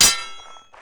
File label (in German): block waffe; mount blade